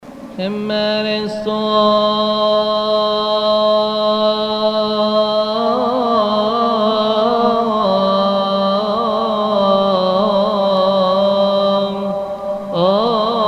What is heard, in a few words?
Khen Pikhrestos Coptic and Arabic; kodas; khoras